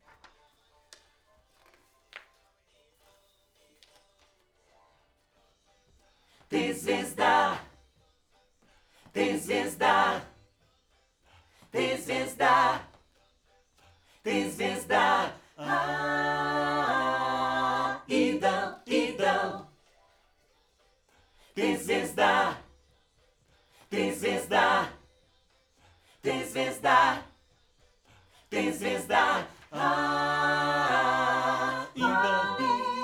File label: Backs AKG